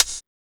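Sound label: HOUSE HIHAT; E-Lab; X Static Goldmine 1; akai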